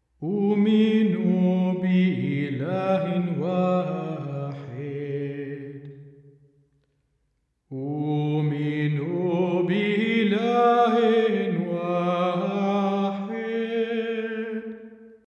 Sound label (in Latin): Credo; Mass Order; أومن بإله واحد